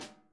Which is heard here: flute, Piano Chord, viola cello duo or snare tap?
snare tap